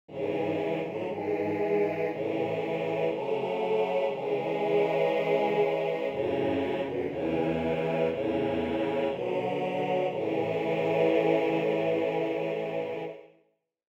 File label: thing in white bells; newcentury